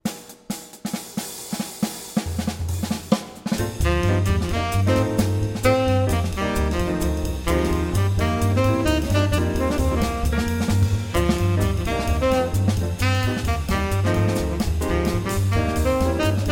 swing; jazz swing

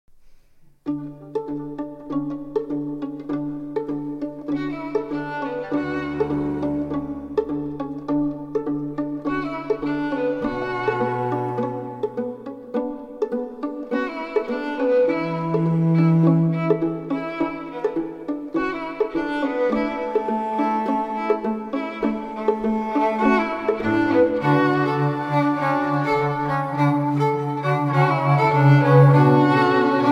strings, String Quartet, Trio or Duo

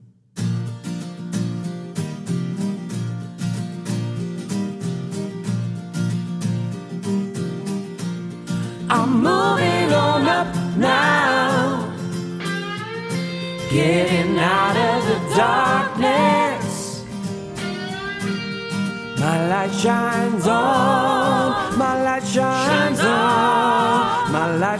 voices

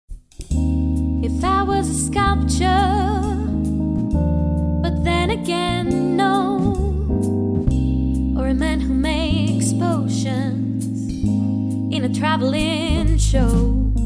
jazz

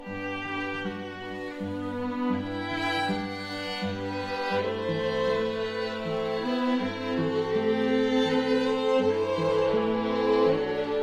strings the swan

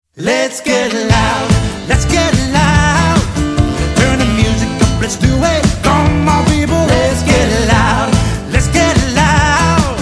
loud medley 5 piece

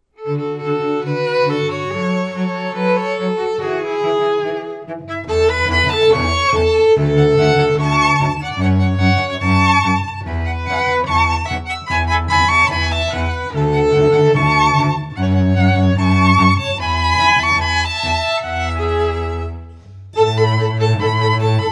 strings; classical musicians

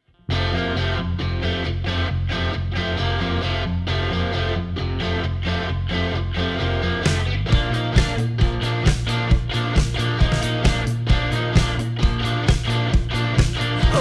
voices

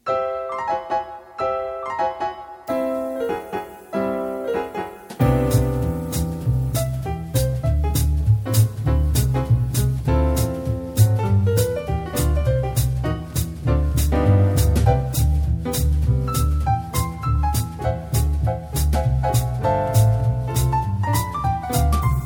jazz lounge trio

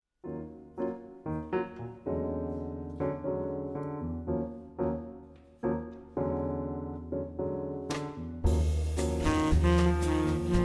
swing, jazz

